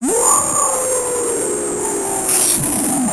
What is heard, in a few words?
death; quake2; tourney; EVA-01